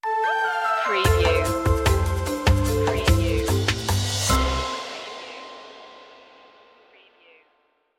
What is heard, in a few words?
flying home stinger